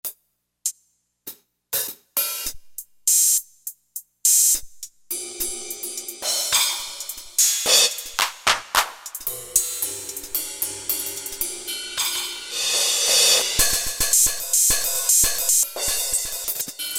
CYMBAL & CLAPS, Factory Rhythm Sets, Roland SC-880